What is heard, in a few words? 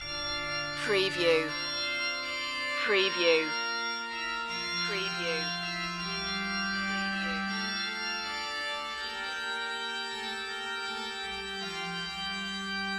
gothic loop